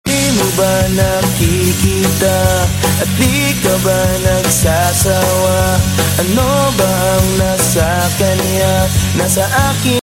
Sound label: cool music ringtone